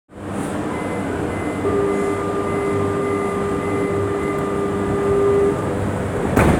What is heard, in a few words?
metro door